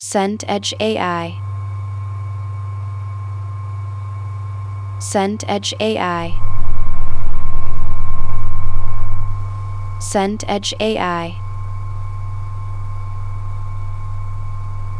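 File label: ambience hospital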